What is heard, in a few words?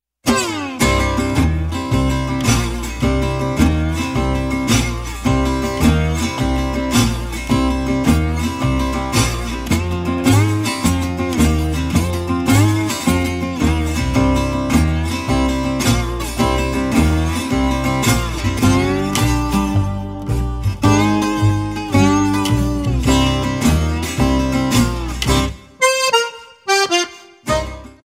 Blues